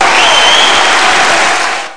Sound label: Roulette; oyaji hunter mahjong 3do gamerip 1995